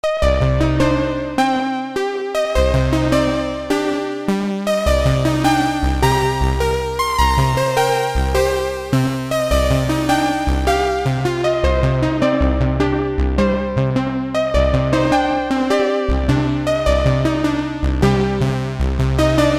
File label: Matrix6r cheesy lead